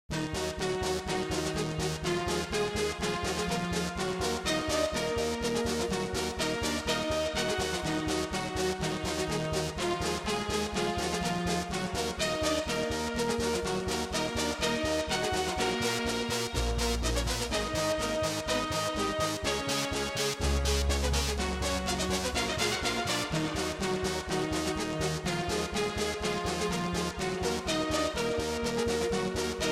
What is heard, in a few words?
Swans; LemEngine